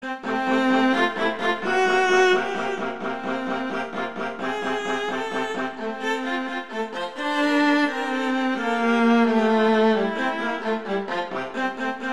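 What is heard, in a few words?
Rondo Allegretto, Duets Viola; Mazas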